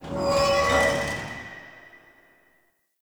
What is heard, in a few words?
Galleon Metal Screech; Warframe; Grineer Galleon